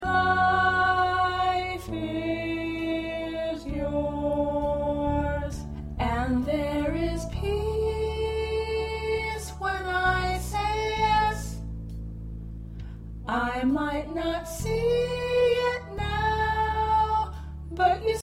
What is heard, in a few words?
Soprano